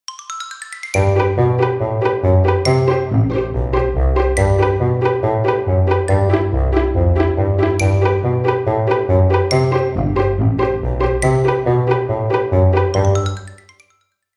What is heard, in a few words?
emoji jingle